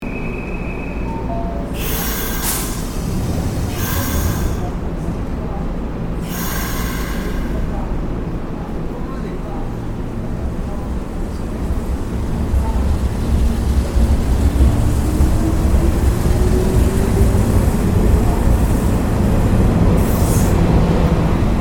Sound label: train start